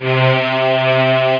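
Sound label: ens strings C3, ensemble strings; Star Trek JR Audio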